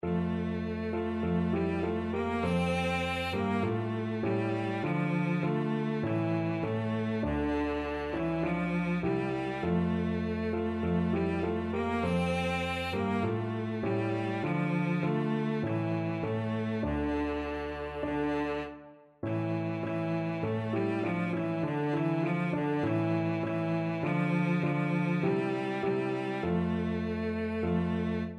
ukraine national, cello

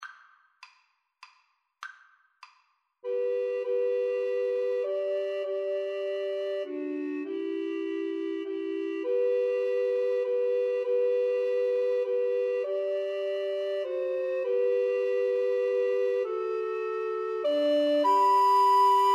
amazing grace, Recorder Trio

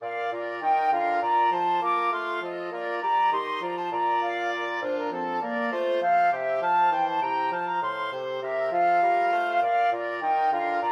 water bouree, flexible mixed ensemble 4 players

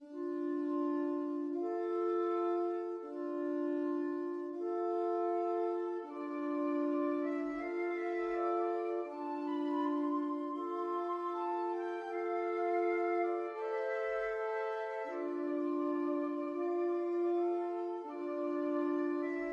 woodwind trio; Holst Song without words

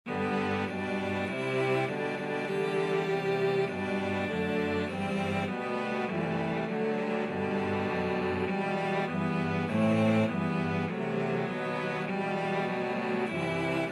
cello quartet

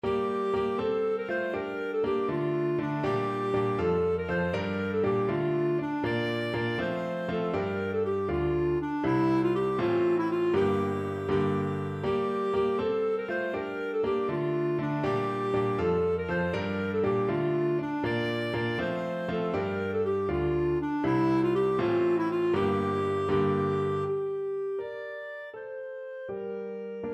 plucks, clarinet; jenny

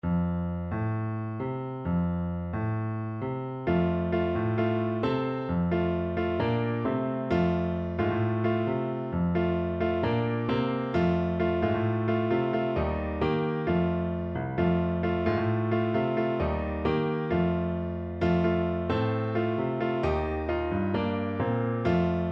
piano

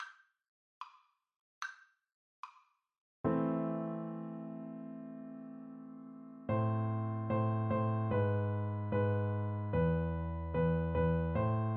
beethoven 7 2nd FL kar, Flute

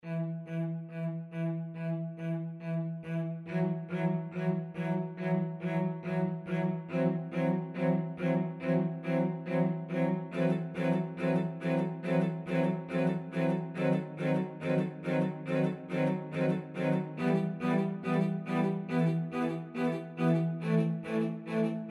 cello quartet; winter

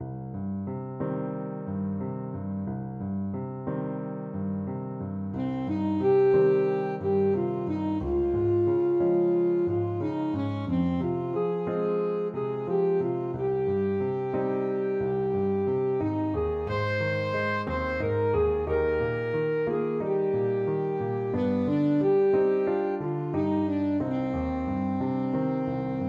northern lights, saxophone

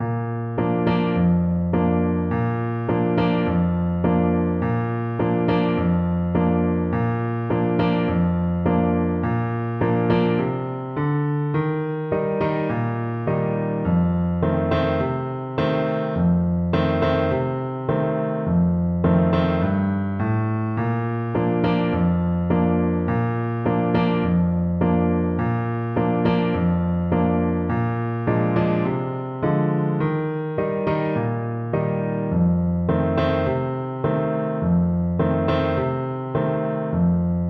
horn, French Horn

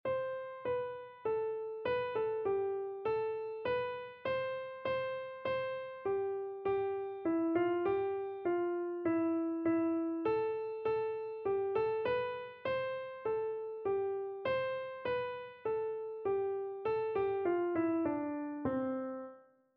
from heaven above, keyboard

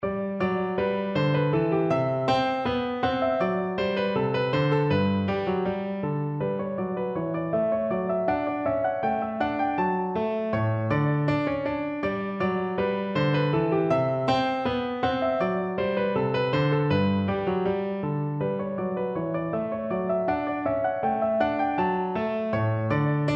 bourree, piano; handel

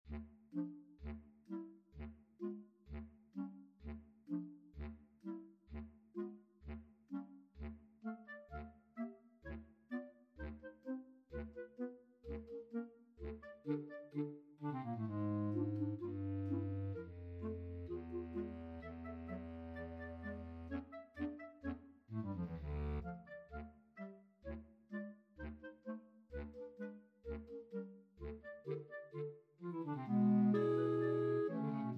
clarinet choir; tchaik

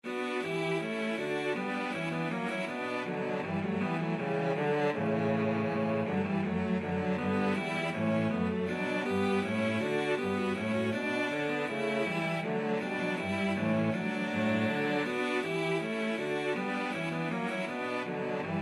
bourree, cello trio

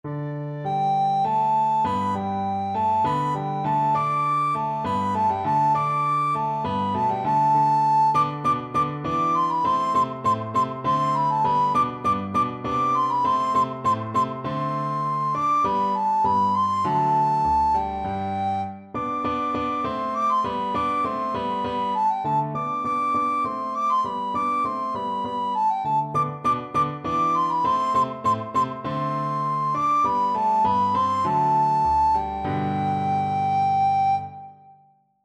water music, recorder; handel

